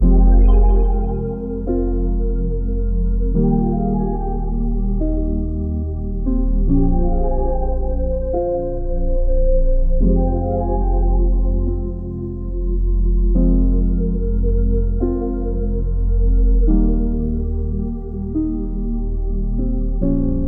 144 BPM F Min, Melody Loops; Gracias; Hip Hop

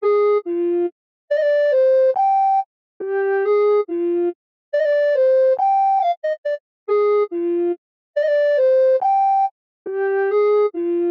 140 BPM C Min Flute, loop stems; Cymatics; Moore's Law; 2022 Melody Collection Platinum Expansion

